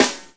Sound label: SD FUNK, SNARES; E-Lab; X Static Goldmine 2; akai